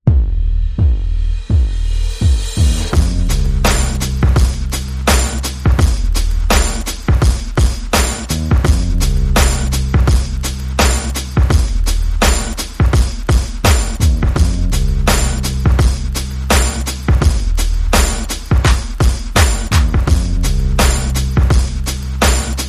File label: Bass Perc and FX Only